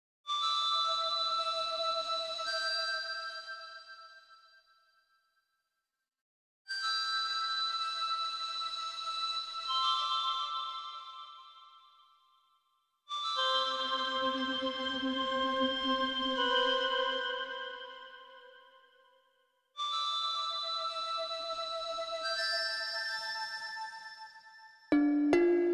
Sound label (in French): esprit de la foret flute